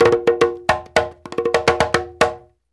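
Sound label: break drum, Reggae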